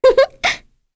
Vox Laugh; kingsraid; voices heroes; en

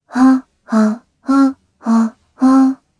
Hum, jp; voices; heroes; Kara; kings raid

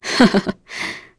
Vox Laugh; kingsraid; en

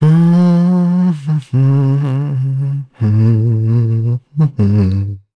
vox Hum; kingsraid; voices heroes en

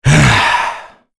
Vox Sad; en; voices; heroes; Kain; kings raid

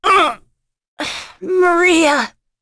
Vox Dead; Morrah; kingsraid; voices heroes en

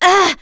Vox Damage; Chrisha; kingsraid; voices heroes

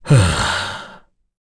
Sigh; Bernheim; kingsraid

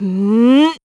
Casting, Vox; en; voices; heroes; Ripine; kings raid

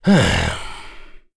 Sigh; Dakaris; kingsraid

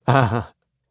Vox Laugh; Siegfried; kingsraid

